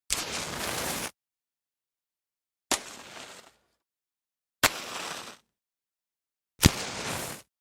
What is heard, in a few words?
single match strikes variations